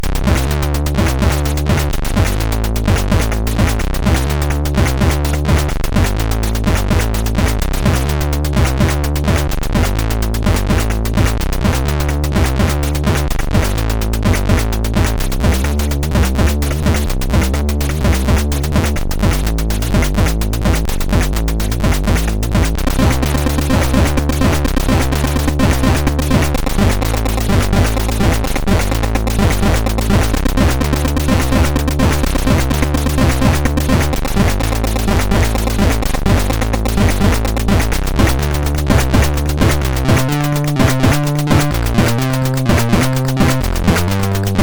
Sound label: Intro, sid MOS6581R2; Amiga Noid Intro